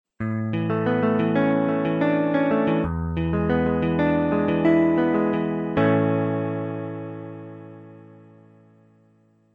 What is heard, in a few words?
jingle; sendai folk emaki